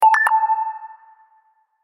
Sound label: Alcatel Slide